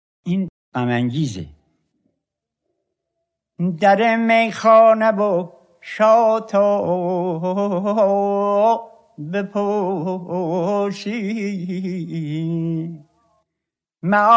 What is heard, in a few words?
gham angiz, dashti